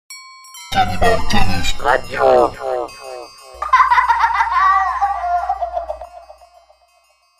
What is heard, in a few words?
jingle